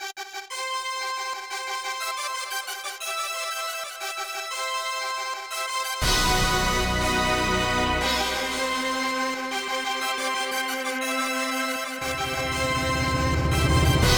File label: jingle version; Shining Force 3; Saturn rip; Grand Final